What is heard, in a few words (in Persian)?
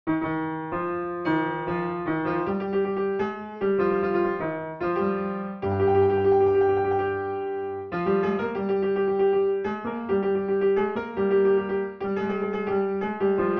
Zabol, piano; Rast-Panjgah; Radif Mahjoubi